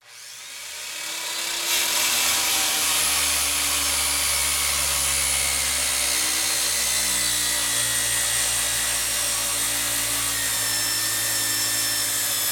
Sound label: saw